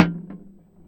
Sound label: Percussive Hit, contact mic